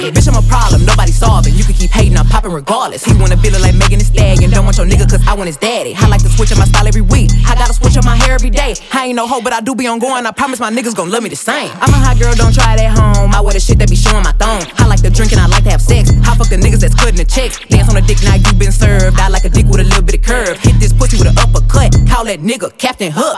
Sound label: English Song Ringtone